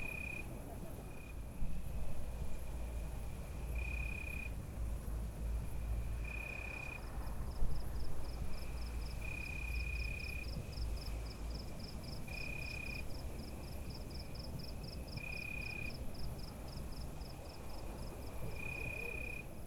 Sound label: Ambience Night